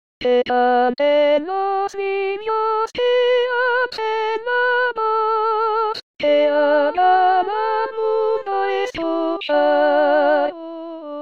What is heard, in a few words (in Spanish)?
canteninos, voz